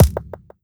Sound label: Kick Noise Reverb